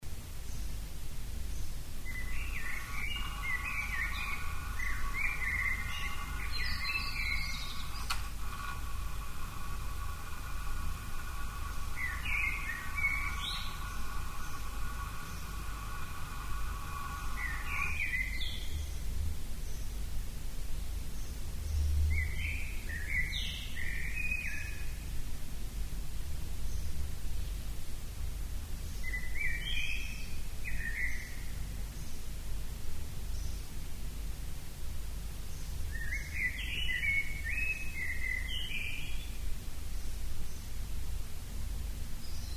halb drinnen; mono; Das Kleine Field Recordings Festival 1

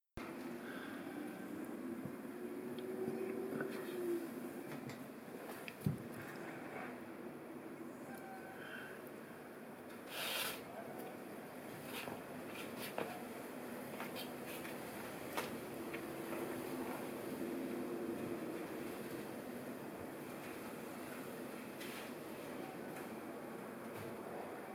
aufm Meer; Curaçao; Original Video Ton (cut); Panasonic Lumix DMC-TZ101EG-K; aufgenommen durch Abspielen der Video Dateien in VLC Player und Aufnehmen mit Audacity (16Bit/44,1kHz); Fieldrecordings

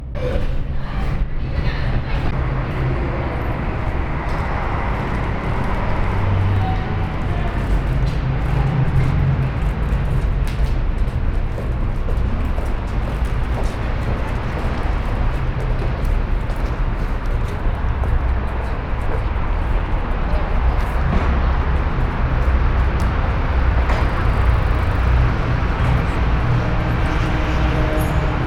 London Strasse; Walkman Performance

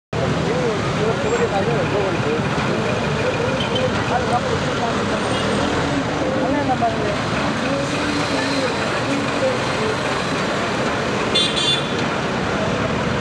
Verkehr, fieldrecording, Kathmandu, Nepal; Boudha, Kathmandu, Nepal; Putali Sadak, Bhaktapur Sadak, Khulla Murch Park

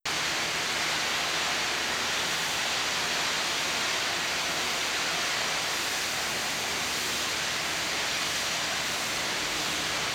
U-Bahn Bau; U-Bahn Restaurierung; Original Video Ton; rauschpartikel Studio unterwegs; fieldrecording

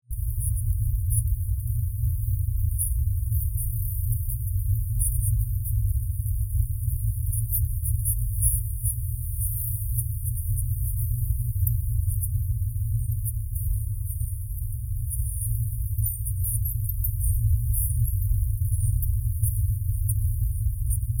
Fahrt nach Swayambu; Original Video Soundtrack - unvoiced; Boudha, Kathmandu, Nepal; fieldrecording

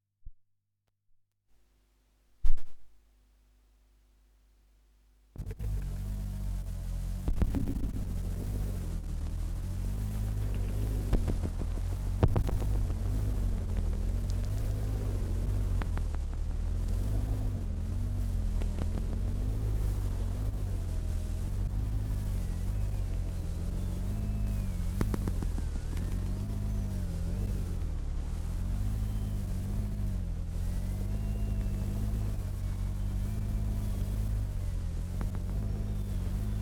Uher 19 cm Lautsprecher Tonband; Abspielen der Aufnahme wendelrekords 4 Tape Echo Mix

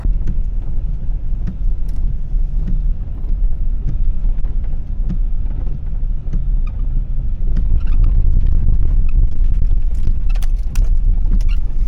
Scheiben Wischer; Fieldrecordings; Brasilien

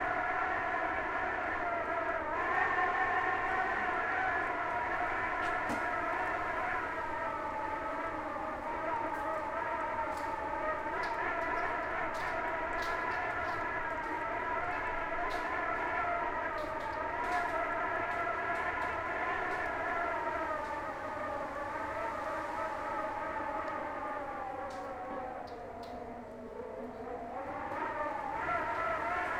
Rode NT4 (Fieldrecording), vor dem Fensterschlitz; Curacao; Fieldrecordings

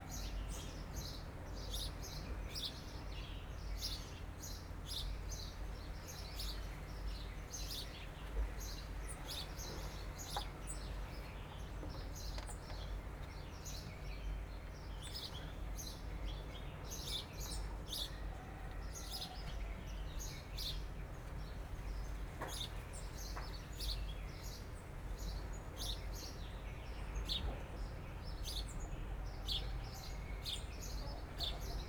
Warten auf Regen, Curacao; Fieldrecordings

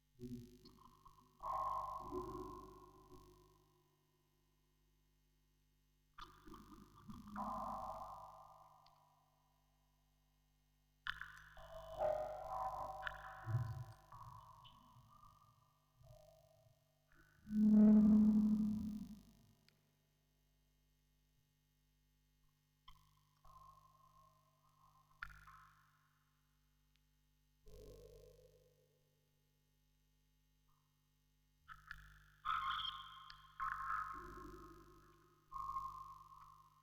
Aufnahme Vocoder, Spieluhren-Quartett; Tonband; Fieldrecording

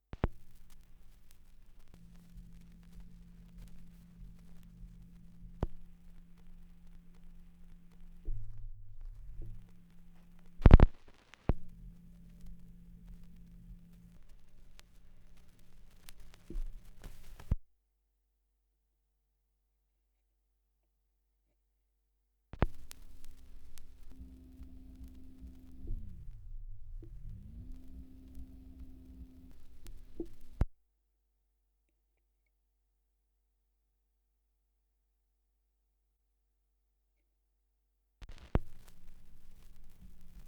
Schallplattengeraeusche 78rpm; wendelrekords 1.2

